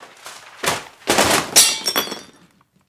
Books Being Thrown

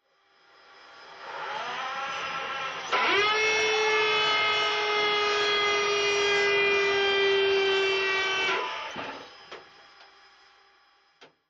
M-60 Tank: Interior Turret Movement. Stereo

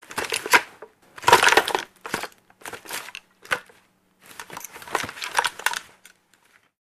Soldier Moves Autom, handgun mvmt

